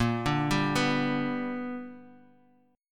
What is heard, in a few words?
B-Minor Major 7th Sharp 5th A sharp